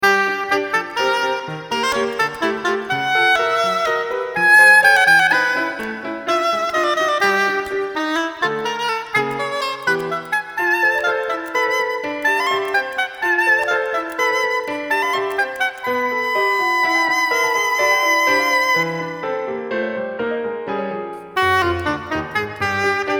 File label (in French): Sonate hautbois; P.HINDEMITH